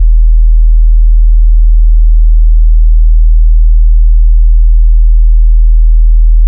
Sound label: BASS E0; USB Soundscan vol.51 - House Side Of 2 Step; AKAI